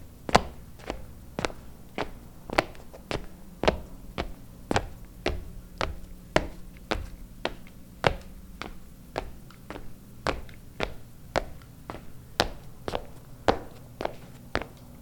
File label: Steps On Wooden Floor